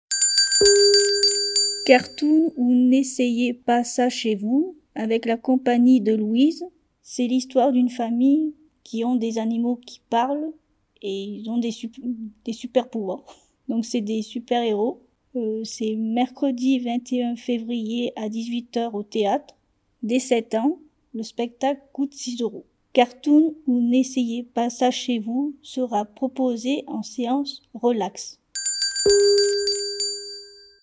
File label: cartoon